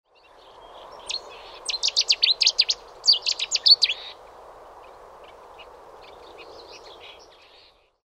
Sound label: cettis warbler; Rainham